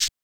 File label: Percs